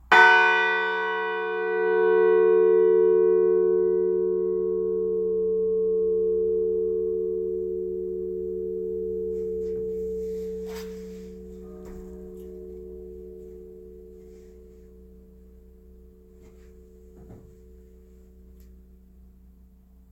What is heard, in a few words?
bells of sussex; milland